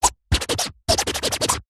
foley fabric fingernail scrape scratch rhythmic like record scratch